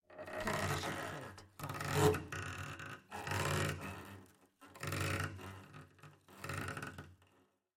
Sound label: Cello Scratchy Noises; SoundsCrate